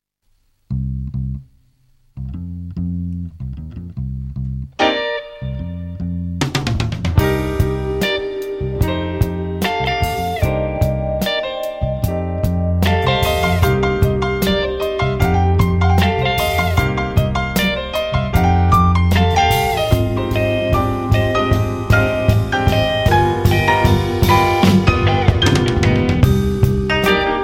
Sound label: shakuhachi & koto jazz funk; wamono